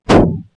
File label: met strk, bugs; Starship Troopers